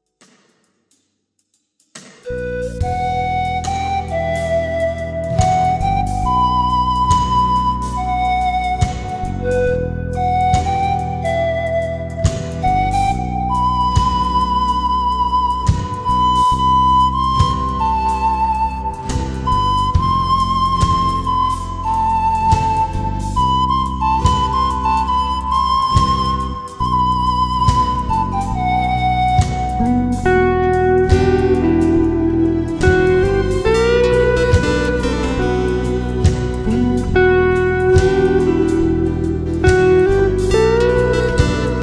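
Relaxing Guitar, instrumentale gitarren und panflöte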